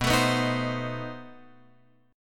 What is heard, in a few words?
B 7th Flat 9th B down Guitar Open F; B7b9 strummed; JGuitar